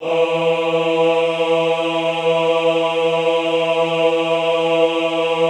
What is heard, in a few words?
AHH E2, Extended Classical Choir; Best Service; AHH MIX